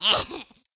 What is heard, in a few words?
poison plant damage, monster